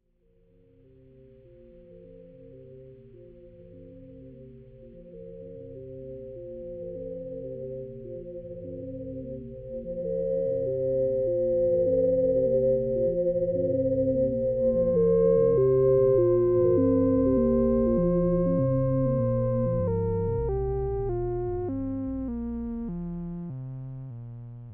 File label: serge slow; double prime serge slow; Polyend